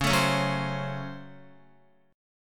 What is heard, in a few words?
Db Major 7th Suspended 4th Sharp 5th Db Guitar; x,4,7,6,6,7; DbM7sus4#5 strummed; JGuitar